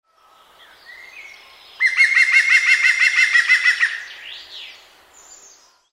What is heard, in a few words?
green woodpecker